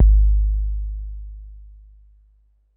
Korg 01W Dance Kit; 300 Drum Machines

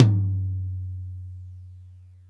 HI DRY TOM, ACOUST TOMS; Greytsounds

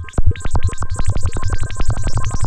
TENTACLES; Pro-Rec - Synthsonic

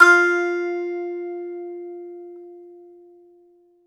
BAGLAMA F07L; E-MU Producer Series Vol. 7 – Old World Instruments

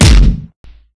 DUTCH KICK; Masterbits; Soniq Elements

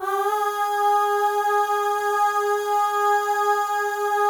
AHS, FEM; Spectrasonics; FEM.1 AHS

